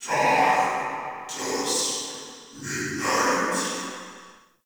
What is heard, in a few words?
Ghost Soldier Vocal Idle